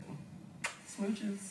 Smooches

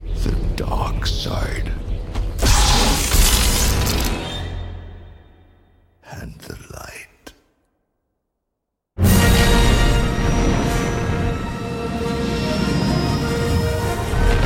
Dark side ringtone

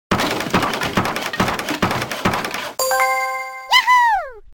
Success

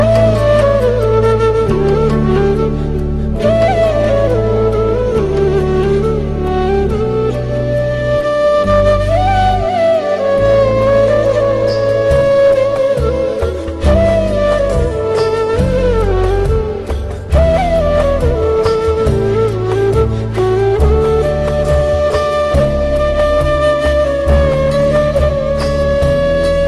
Flute

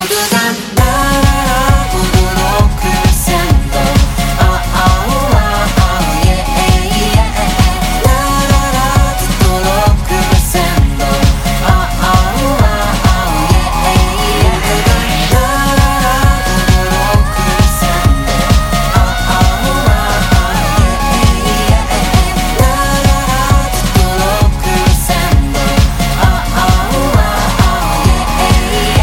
Crescendo - Am

